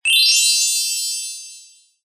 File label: fantasy magic glissando rise bell tree style fairy very high pitched; zapsplat